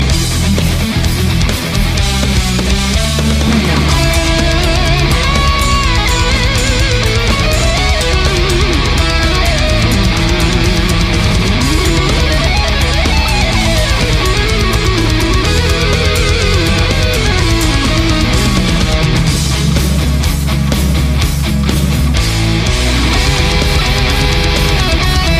Power rock solo

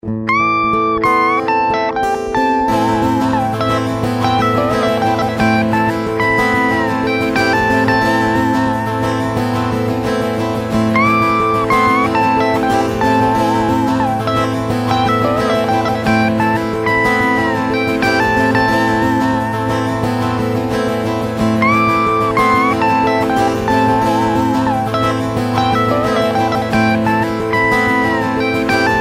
sad solo

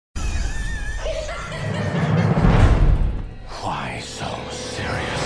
laughing; joker; why so serious

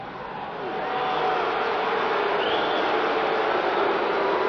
Crowd Booing

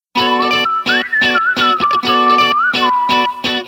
wistle guitar

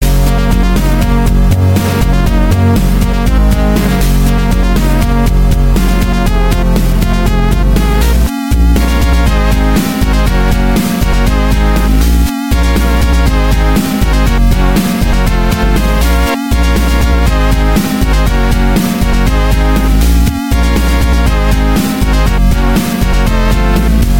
viol; electronic

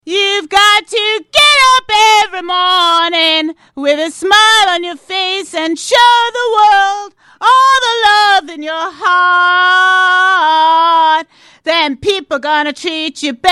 Shower sound

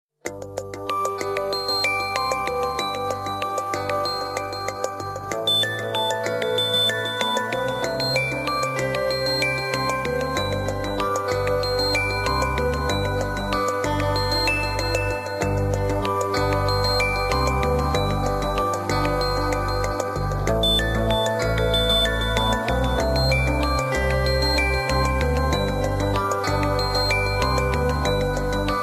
Sunset Melody

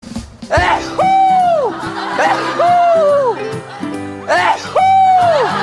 sneeze; Shinee Minho

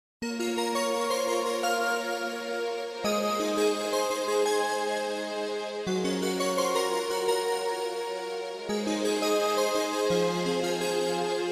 relaxing sms tone; ringtones

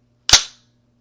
m4 bolt release

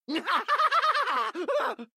Laugh; Junkrat

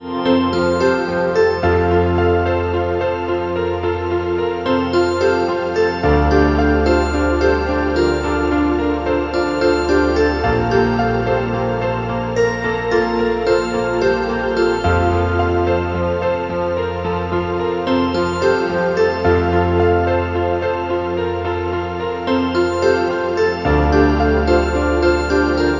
Guitar

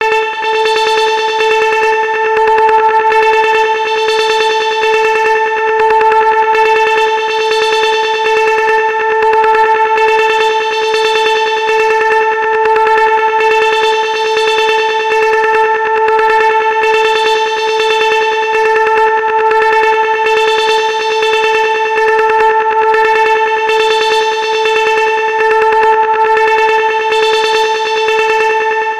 Echo

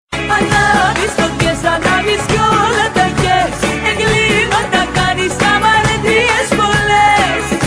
Melodious Arabic

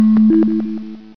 VOX Bloop

